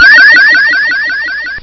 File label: alert; sci-fi sensation